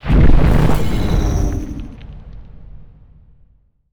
necroid moving out destroyer; Stellaris; necroids

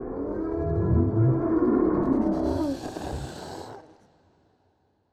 voidspawn death; Stellaris; reanimated

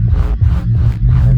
arc emitter windup, weapons; Stellaris

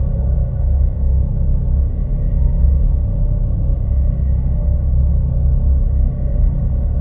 technosphere idle; Stellaris; guardians

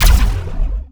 plasma fire, weapons; Stellaris

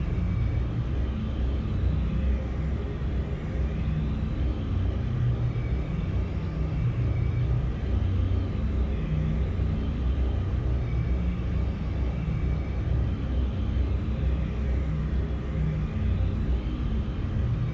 ship idle; skrand; Stellaris; paragon; ships

